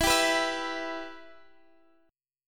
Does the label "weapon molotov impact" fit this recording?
No